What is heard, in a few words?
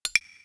Shutter; Samsung SCH-M710 T Omnia II